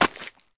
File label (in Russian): vlyapatsya v vesennyuyu kuchu gryazi